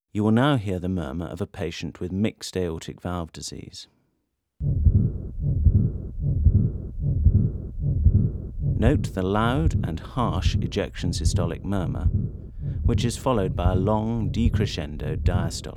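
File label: Aortic Stenosis Regurgitation, Breath Heart Sounds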